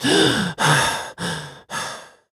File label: voc gasp male; oblivion; Realistic Fatigue